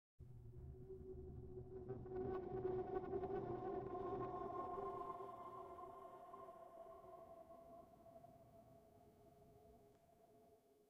sound of death moment of

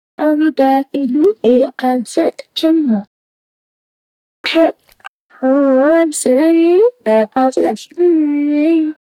create a wav sampler of, 117 bpm; SFX Engine

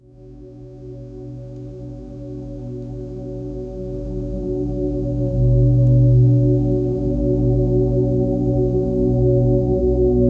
the ambient sounds of an, mysterious

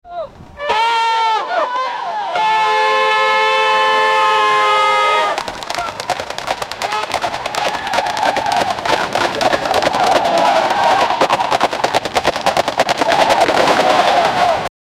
the medieval cavalry army

